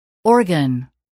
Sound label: organ